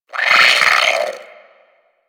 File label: Sentient Brachiolyst Pain